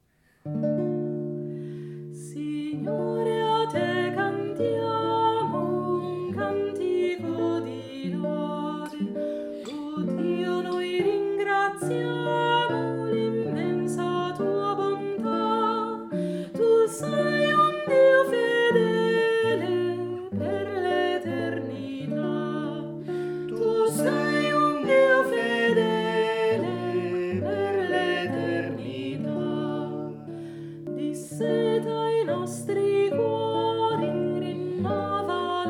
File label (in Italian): chitarra